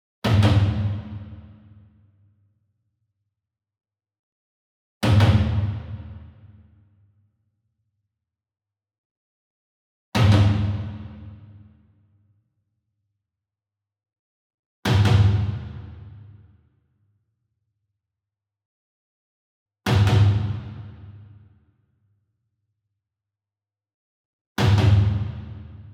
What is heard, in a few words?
SINGLE DRUM Kodo Double Hard Beater Far, Cinematic Strikes; boom library; Cinematic Strikes Construction Kit